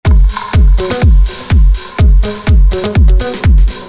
loop, clubcore; mateo thode

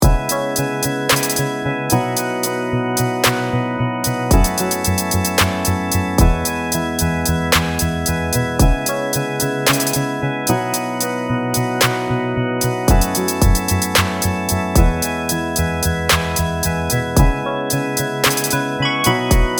pluck organ

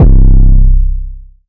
phonk 808 C; phonk toolkit; rocket powered sound

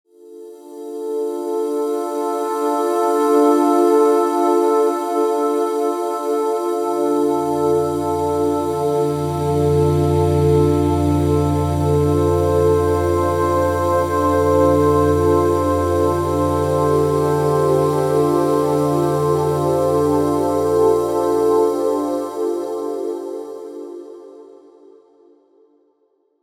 sad october; porphyra hybrid by ocean swift synthesis

